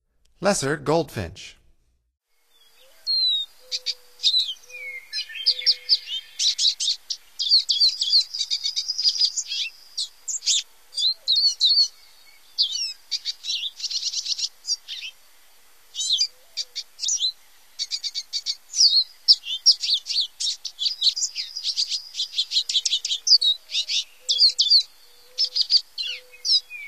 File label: Lesser Goldfinch, Songs of Birds of North America; Stokes